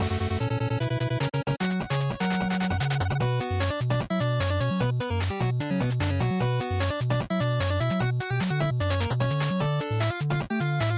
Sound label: NES Action Boss Loop150